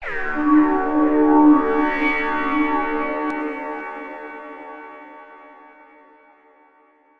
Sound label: SH101 Tone (FX); SH101 Tones; SYNTH KEYS